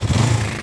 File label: Vehicle Motorbike Accelerate; Playable Tutorial Level